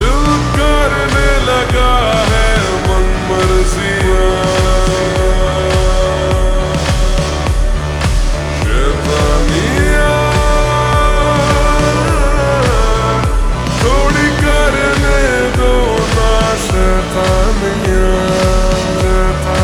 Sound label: Slowed Reverb